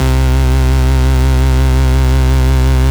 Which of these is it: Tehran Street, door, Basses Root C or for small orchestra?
Basses Root C